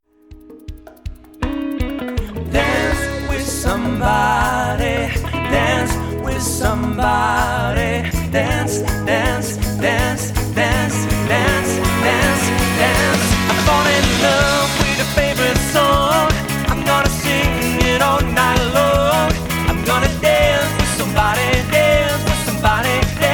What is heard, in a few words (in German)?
rock und pop band party medley